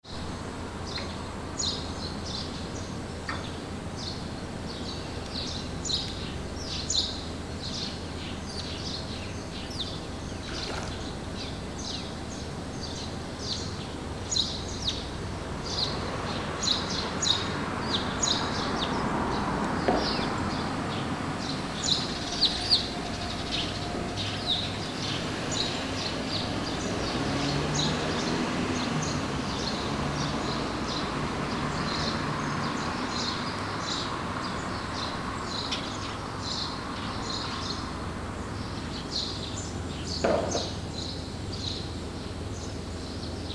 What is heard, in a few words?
fishing villages